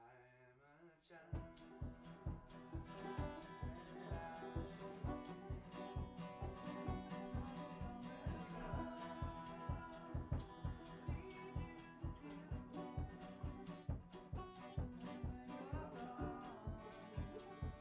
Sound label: Bass Mic